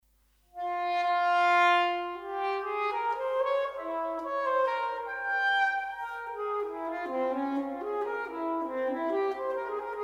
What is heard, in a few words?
Violin Partita No.2 in D Minor, Allemande; Bach; BWV 1004